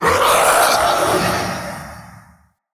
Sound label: Male Vocal Pre Death, Enemy; Mockery; Mockeries